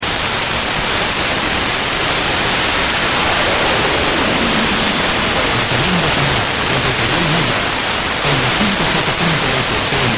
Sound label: 250 090 MHz